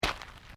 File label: FOOTSTEPS SNEAKERS GRAVEL FINE STEP